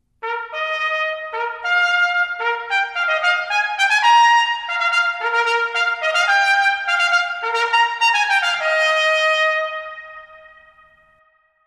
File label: Fanfare